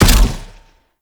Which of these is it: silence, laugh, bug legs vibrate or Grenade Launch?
Grenade Launch